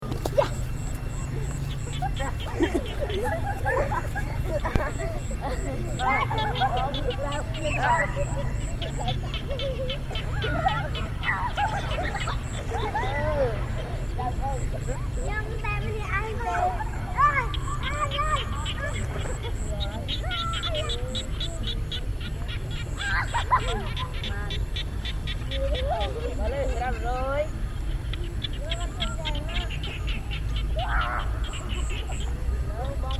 enchanted dusk, cambodia

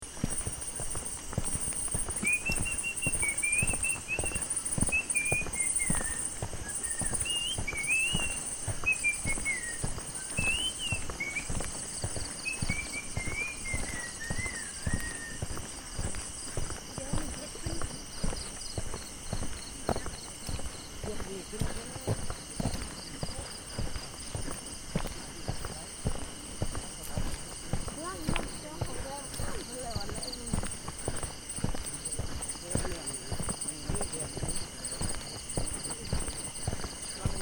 dusk walk home, laos

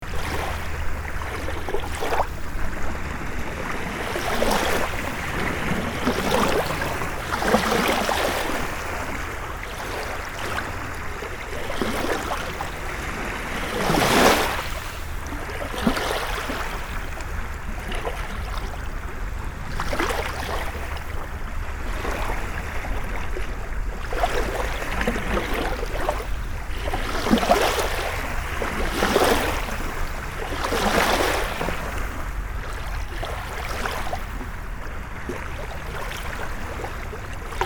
michigan slap, surf

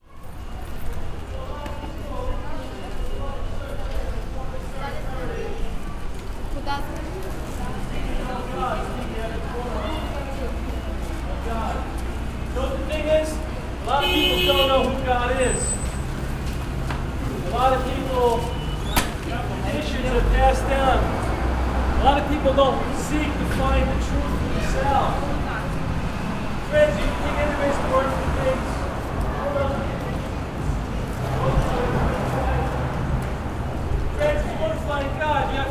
Brooklyn subway

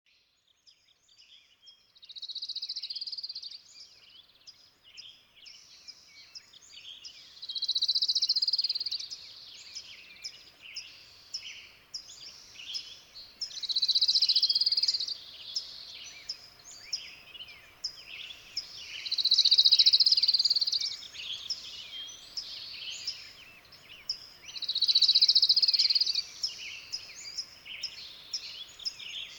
Sonoma Ambiance

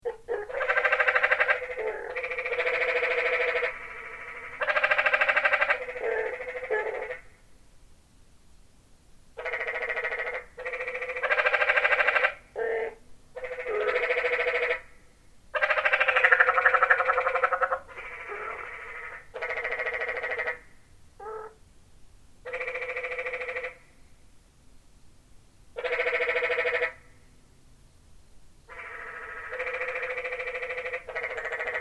Zion Canyon Tree Frogs

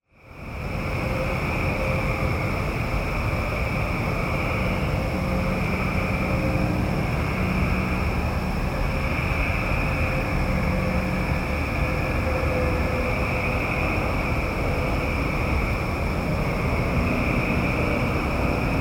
Frogs; Duquaine

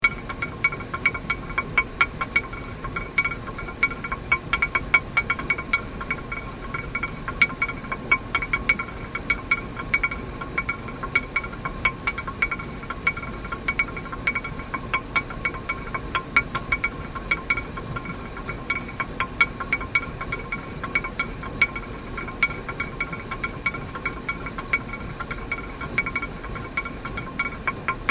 corn grinder

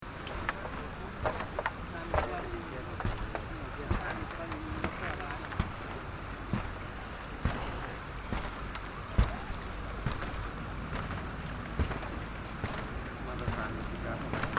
bamboo bridge, laos

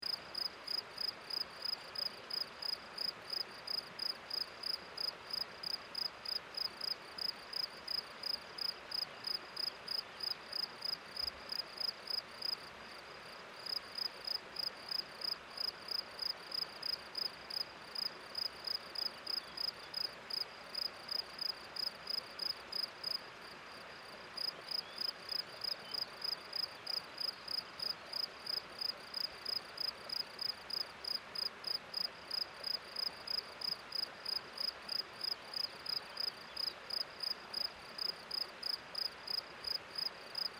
Hillside Crickets, Nodar Portugal

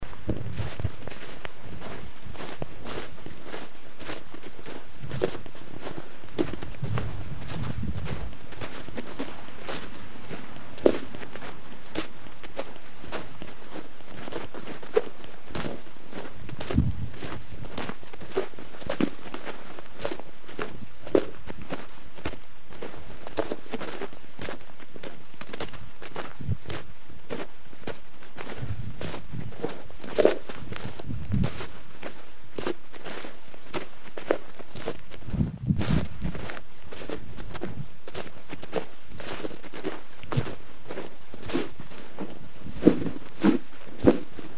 Snow Walk

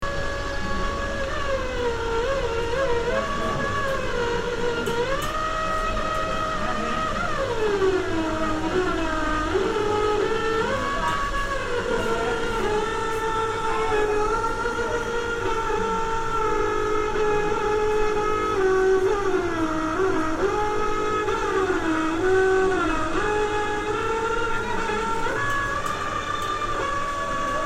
fuzzy radio

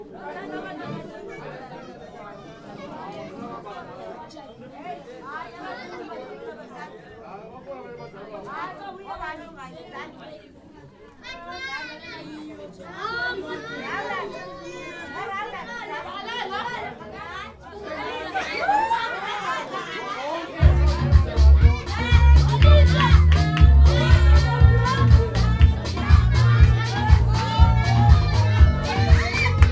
South Africa Township Shebeen

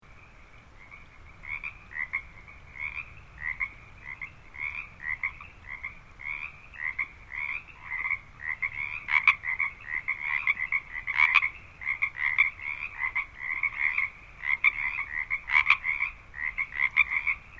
Harbin Hotsprings Frogs; Quiet American; DSM 6S-EH

